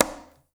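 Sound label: conga, right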